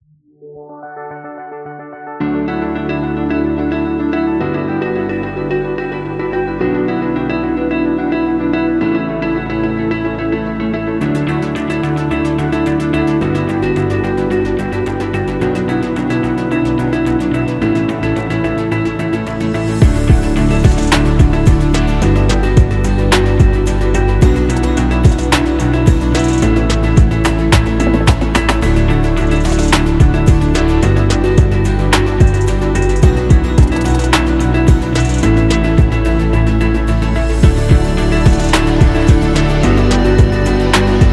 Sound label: Success Corporate